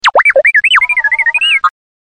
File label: R2D2 Message